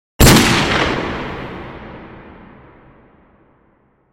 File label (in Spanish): pistola